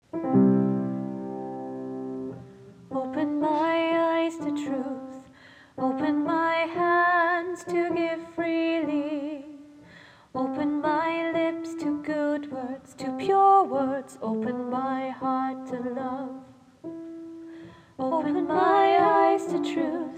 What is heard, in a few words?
Open SATB